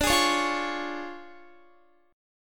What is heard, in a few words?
D Minor Major 7th Flat 5th, D, Guitar; DmM7b5; strummed